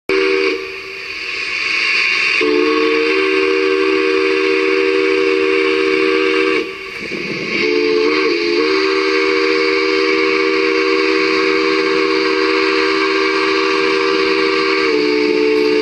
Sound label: Three Chime Full Scale Replica